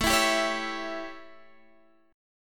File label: A 7th Guitar Drop D, chord